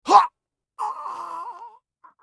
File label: scav death; eft